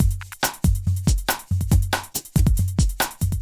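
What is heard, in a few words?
industrial 140 kit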